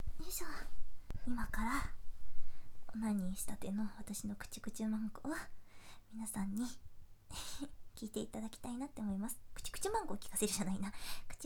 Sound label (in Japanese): ノイズ除去前 くちゅ音 ステレオ